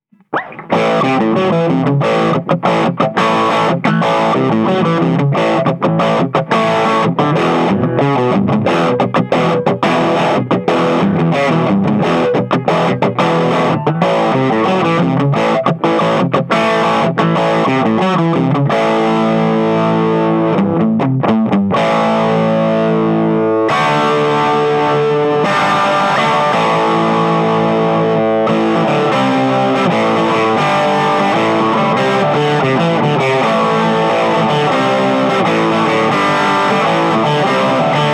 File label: Amp Crunch